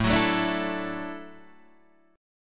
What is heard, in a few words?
D-Major Add 9th A down Guitar Standard; strummed; JGuitar